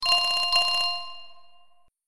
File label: Basic tone